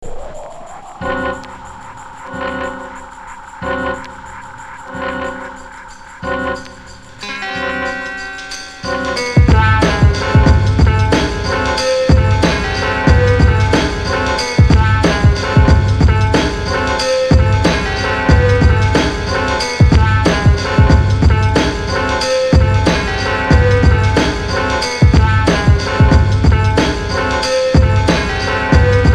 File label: beat